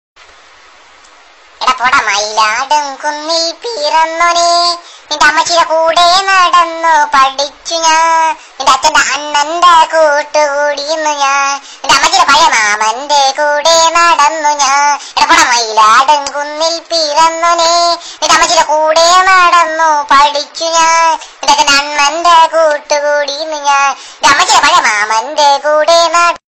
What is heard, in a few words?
Funny Song; Talking Tom